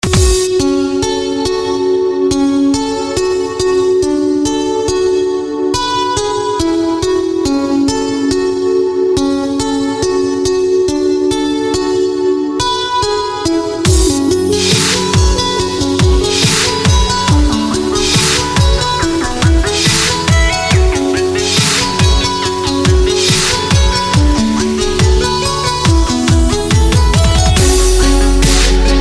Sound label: Energetic Trance